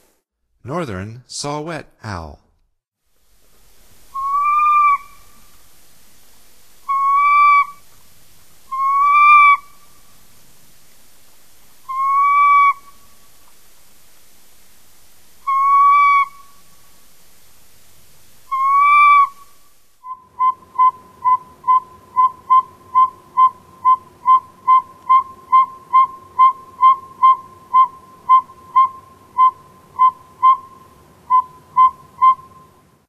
Northern Saw-whet Owl, Songs of Birds of North America; Stokes